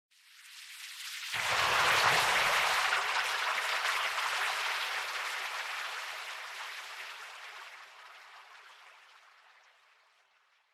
dragon Fountain Spit; Keepsake

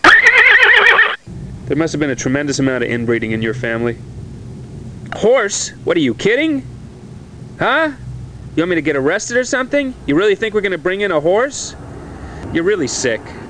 HORSEY; The Interactive Adventures of Seymore Butts

